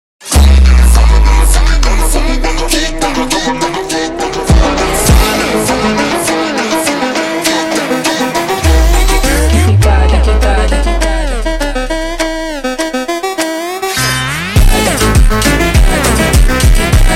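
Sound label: slowed, phonk music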